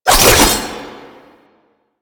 Passive Sickle Spin; Warframe; Warframes; Dagath

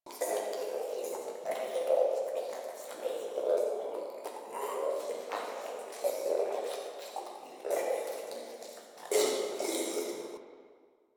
Interior Critter Loop; Warframe; Infested Eris; Ambience

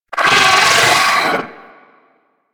Amalgam Moa Satyr Vocal Death; Warframe

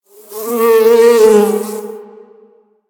Exterior Foreground Bug Flyby, Ambience; Warframe; Duviri

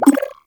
Blessing Thank You Bubble, UI; Warframe; Blessing Device Menu

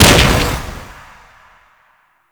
Jet Pack Idle Explosion; Jet Pack Marine; Warframe; Grineer

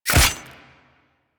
Drone Blade Hit; Weapons

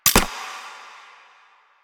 Mech B, Weapons, Sidearm; Warframe; Tenno; Wraith Sidearm; Epitaph Prime

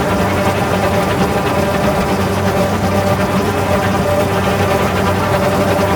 Fighter Launchpad Ext Hover Engine Loop; Ambience; Props; Warframe; Corpus Railjack

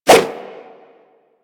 Nunchaku Whoosh, Weapons; Warframe; Tenno

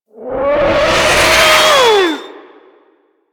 Artillery Whizzby; Enemies; Abilities; Teralyst

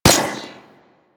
Rifle Fire; Warframe; Venus; Venus Hyena Astro; Weapons

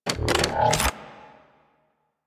Arch Gun Reload; Warframe; Archwing; Hildryn

